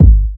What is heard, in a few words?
dry kick drum single shot g sharp key